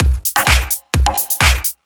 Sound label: Straight Loop 128 BPM, House